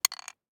DICE on Wooden Tray, Throw and Roll, Mini, 1 One Die; Samples; ArticulatedSounds; DICE Sfx Party (Extended)